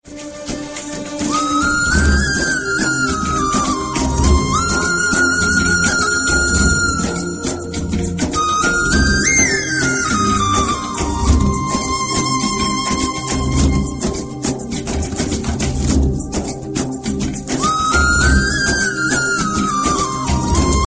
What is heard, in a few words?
best flute ever ringtone